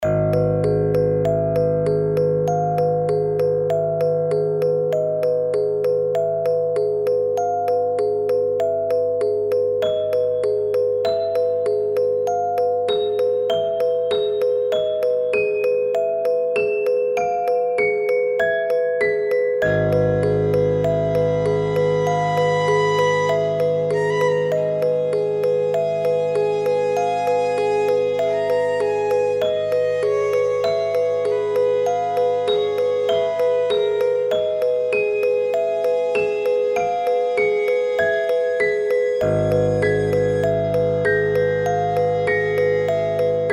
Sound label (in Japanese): オルゴール